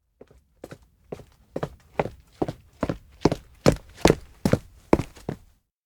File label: steps indoor town hall stairs thud; makoto; anemoi パッケージ版 1.1